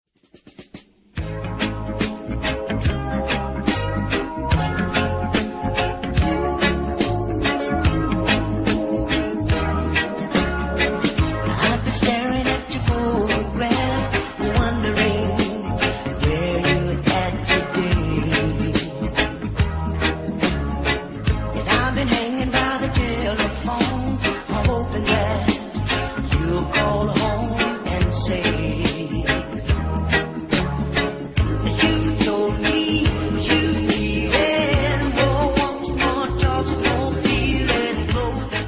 reggae lovers rock, ringtones

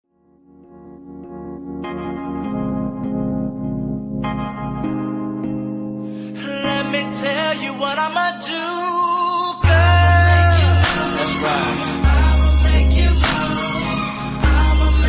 moan

